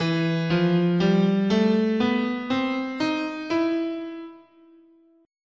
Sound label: E Neapolitan Minor, guitar, scale